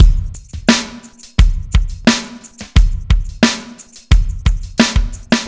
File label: slow fat, Boombap Drum loops